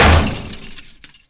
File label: chainlink bash; scripted sequences, a2